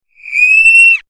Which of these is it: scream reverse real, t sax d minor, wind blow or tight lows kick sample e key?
scream reverse real